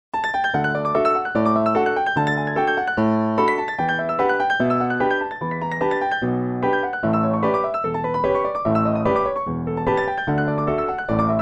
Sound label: Distortion Fun